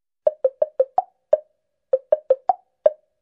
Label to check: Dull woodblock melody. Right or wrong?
Right